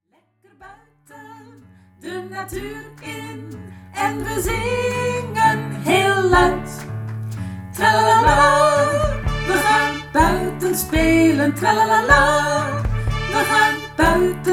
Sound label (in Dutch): Tralalalaaa we gaan buiten spelen